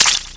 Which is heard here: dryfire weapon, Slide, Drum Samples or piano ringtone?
dryfire weapon